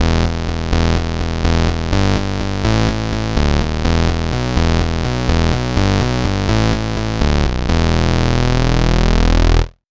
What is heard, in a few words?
saw wave; bass